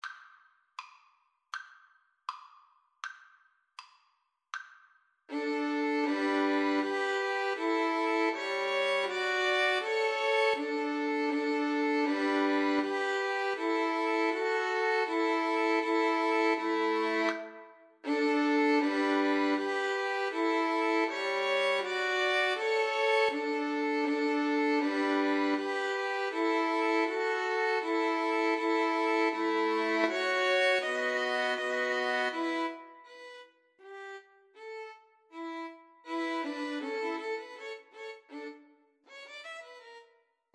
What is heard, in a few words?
Violin Trio; Bach Gavotte Cello Suite 6